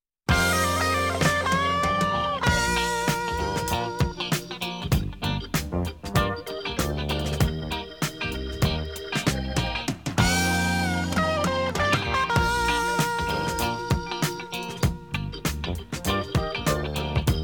30 Sec. Music Bed With Tag Sing- Come Along And Have A Look, You'll Be Surprised